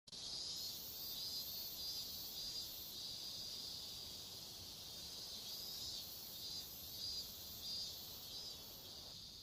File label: cicada calls